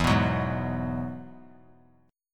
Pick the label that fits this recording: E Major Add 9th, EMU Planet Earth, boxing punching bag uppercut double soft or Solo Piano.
E Major Add 9th